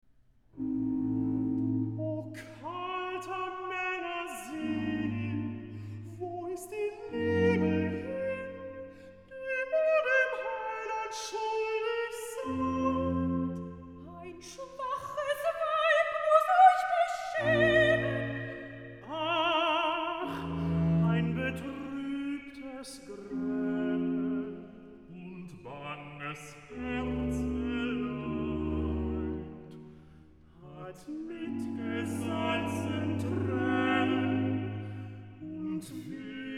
Oster-Oratorium, Recitativo (SATB); Bach, J S; Easter Oratorio; Oster-Oratorium, BWV 249; O kalter Manner Sinn

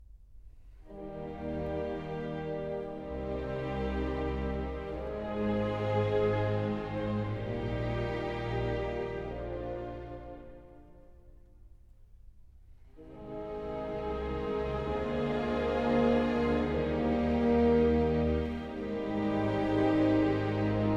Piano Concerto No. 2 in B-Flat Major; Op. 19; Beethoven

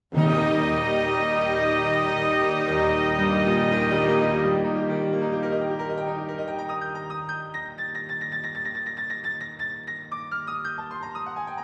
Piano Concerto; Beethoven

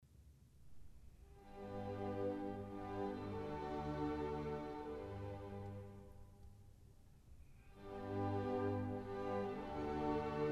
Concerto for violin & orchestra; Kyung-Wha Chung; Bruch

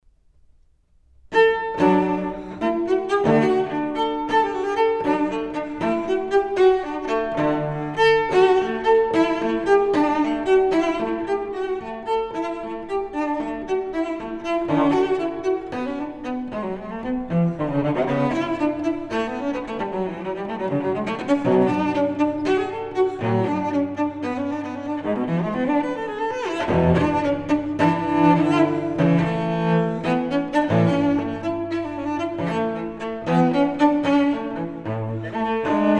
Suite for solo cello No. 6 in D m

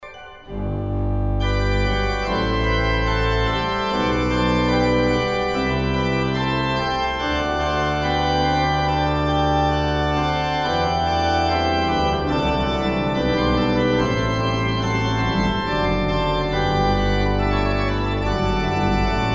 Fantasia in G major; BWV 572; Bach

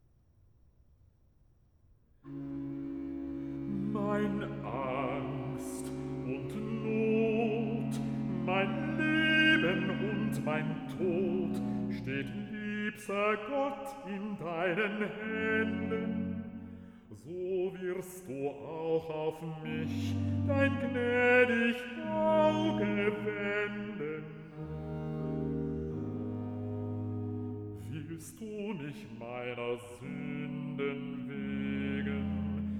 Recitative (Bass); Ich steh mit einem Fuss im Grabe, BWV 156; Mein Angst und Not

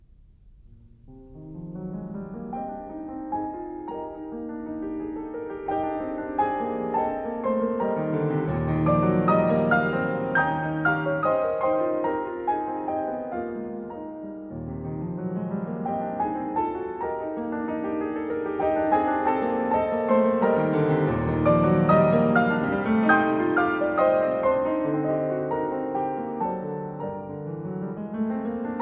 Prelude in E flat major, piano; 24 Preludes

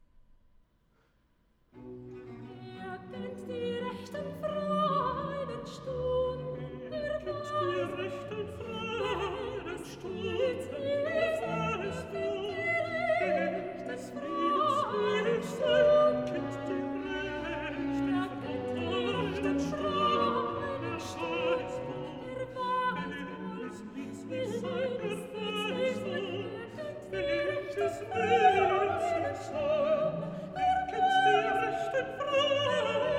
Aria (Duet); Cantata No. 93, 'Wer nur den lieben Gott lässt walten' BWV93; Bach; Philippe Herreweghe; Cantatas

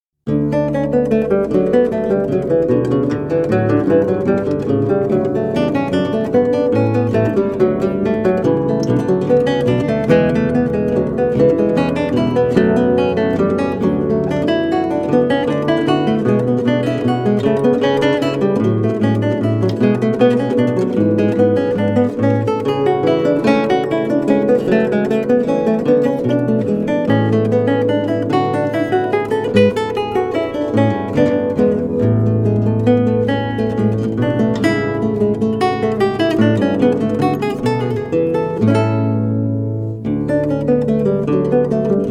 Allegro, E-Flat Major, Lute; Prelude, Fugue and Allegro; BWV 998; Bach; JS Bach - Complete Music for Lute

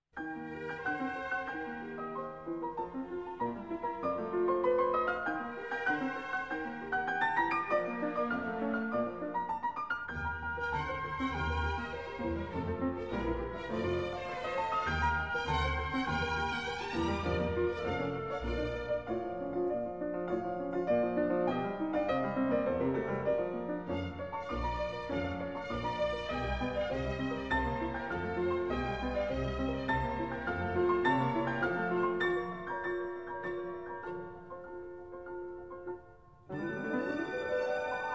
Piano Concerto No. 2 in B flat; Brahms

